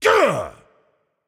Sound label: grunt attack; chaac